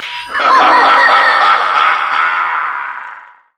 gangplank cannon; LoL; special forces gangplank

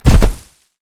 pounce land; nidalee base

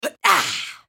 spell cast effort; Diana

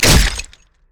basic attack knee; rengar

